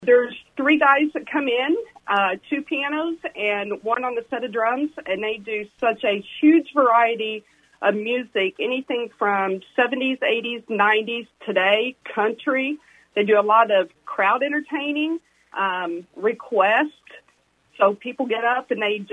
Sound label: dueling pianos; Audi